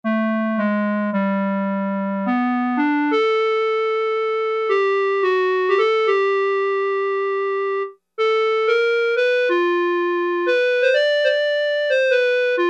Clarinet, Roland Juno-60; Factory presets

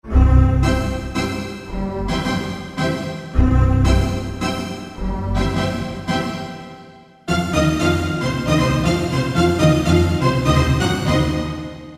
Orchestra Hit, Roland PN-D50-02

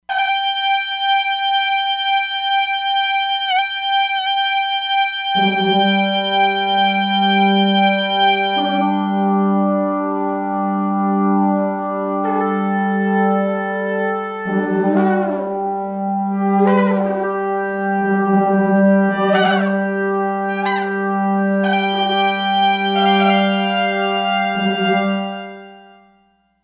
Shofars, E-mu Proteus-3; Factory patches

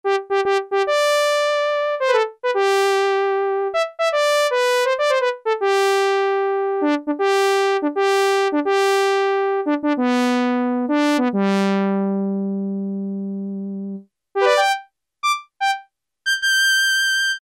TRUMPET, Kawai SX-240

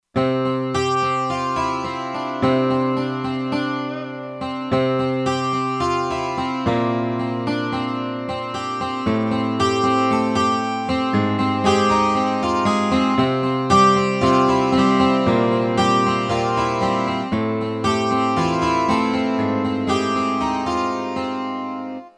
12-str Guitar, Kurzweil K2500X; Factory Programs

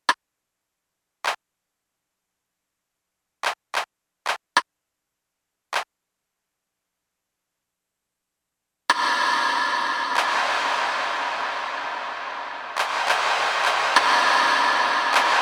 10 Sec. LARGE BRIGHT, Alesis MidiVerb

